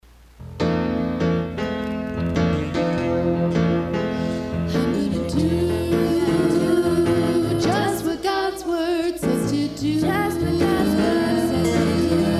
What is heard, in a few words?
blues